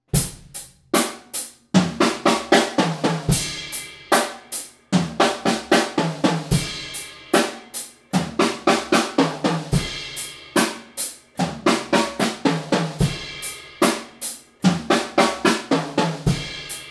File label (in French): break triolet; passe partout